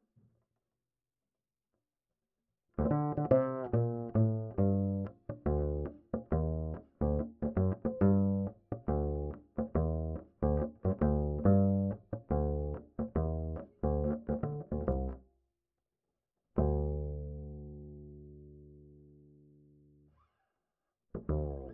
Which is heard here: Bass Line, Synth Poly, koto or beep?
Bass Line